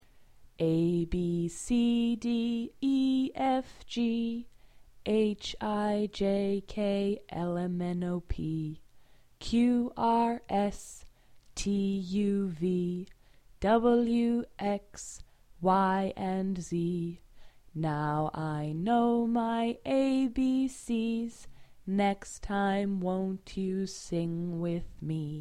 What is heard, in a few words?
the alphabet song